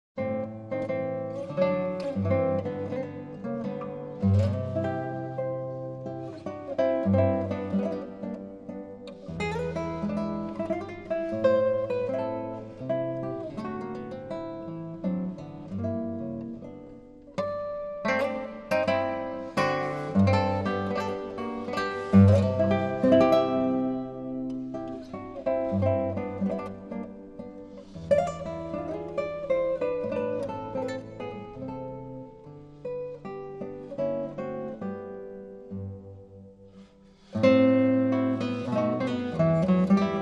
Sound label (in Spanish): Mazurka En sol, Guitar Pieces